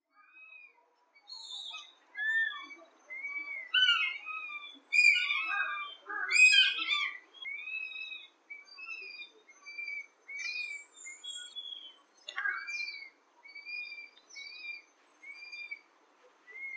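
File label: ootaka, northern goshawk; saitama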